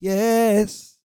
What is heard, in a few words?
GOSPEL